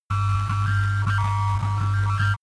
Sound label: signal interference; soundpool